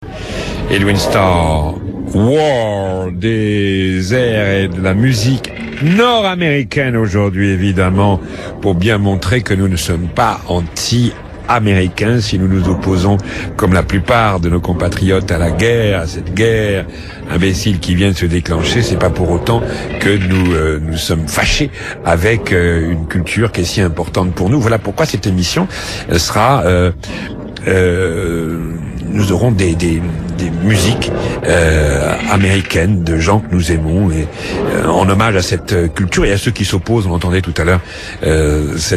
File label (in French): Musique US